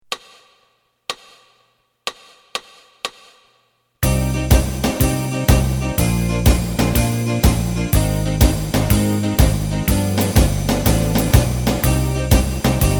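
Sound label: lead guitar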